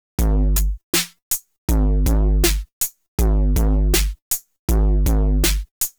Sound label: Rhythm